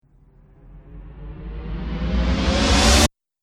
Cinematic Riser Abrupt Stop; Rise And Dive Sound Effects

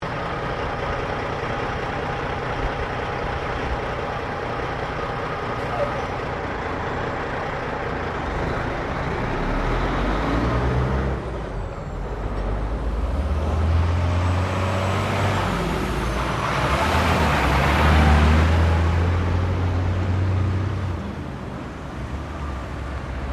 Traffic Semi Trailer Drive Off; General traffic sounds; Traffic and Cars; SoundEffects-Foundation4

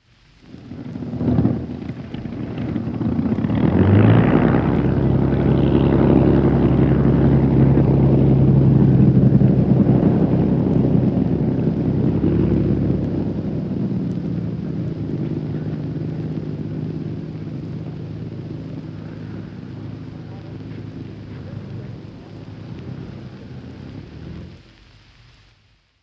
Exterior, taxi-ing World War II fighter-bomber; From 78 rpm disc; Airplane; BBC

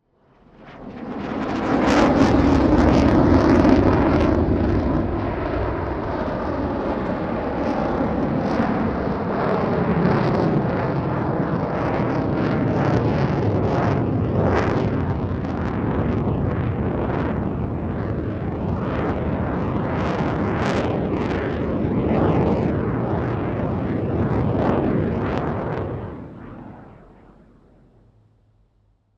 F16 Fly By Long, Airplane; Vehicles

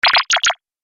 Laser Lock

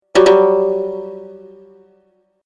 Message Error, beep

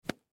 Cloth Drop On Cement Rag; Drops (Sound Effects:Objects); Foley; Blastwave

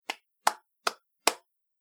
Four Hand Claps; Human Body Sound Effect

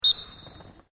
SPORTS WHISTLE UMPIRES SHORT; Equipment (Sound Effects:Sports)

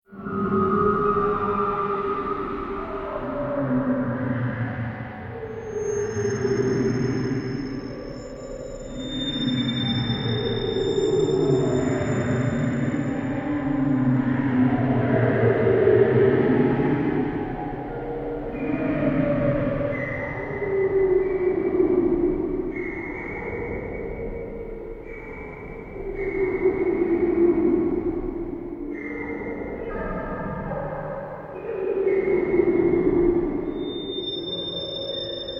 Drone Scifi Horror Alien Ambient Voices; Drone Scifi Horror Texture Atmosphere Alien Ambience Voices Creatures Ghosts Dark Ominous; Scary Soundscapes

